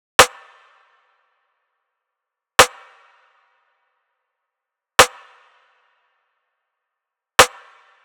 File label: Drum Loop - 100 BPM Rim, RnB; Loop Stems; Cymatics; GHOST (Beta Pack); Atrophy Drum Loop